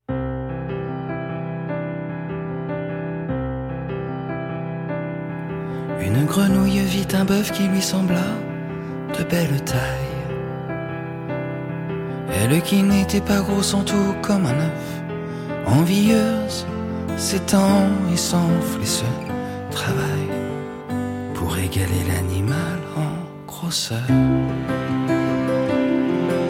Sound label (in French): chanson; la grenouille qui se voulait aussi grosse que le boeuf